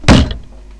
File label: table thump